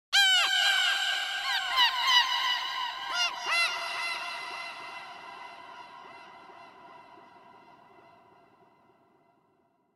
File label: Gull Eerie Croak - Triple; Hollywood Edge; sound designer's toolkit v2